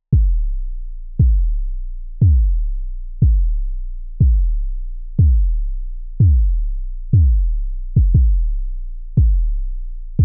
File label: F120 kick; ODC Impro